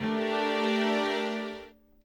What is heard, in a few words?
chord